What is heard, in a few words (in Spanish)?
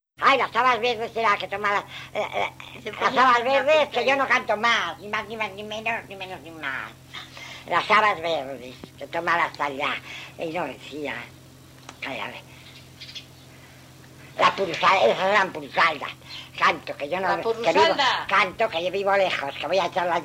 La porrusalda, Villafrechós